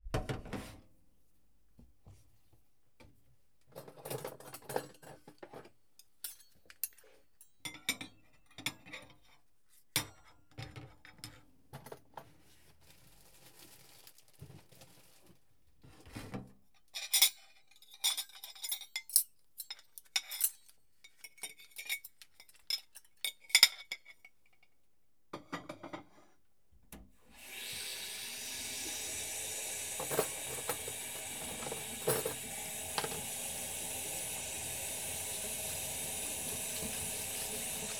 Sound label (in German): kueche; tascam dr-22wl